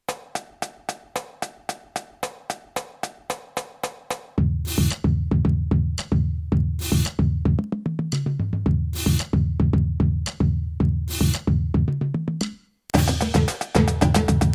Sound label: rampage cadence